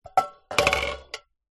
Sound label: food tin can fall over on surface; zapsplat